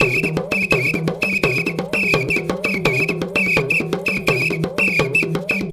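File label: tama foudang groove; Best Service; World Colours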